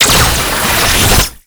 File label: Gunbrella Alt Charge Up; Warframe; Tenno; Koumei